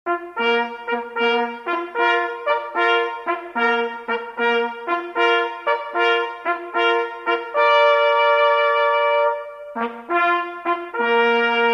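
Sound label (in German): Hase tot, Jagdhornblasen, Totsignale; Brauchtum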